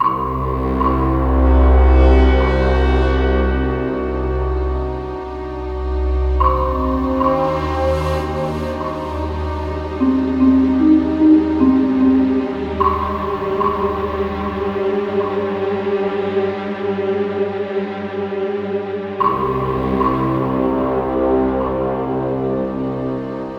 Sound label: Vietnam Ambient Without Drum; Rambo The Video Game